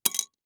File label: Industry Tool Wrench Set On Pavement